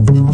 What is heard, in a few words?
Upright Bass Notes